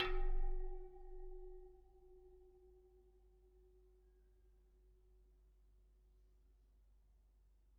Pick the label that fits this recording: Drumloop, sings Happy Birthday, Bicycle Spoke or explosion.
Bicycle Spoke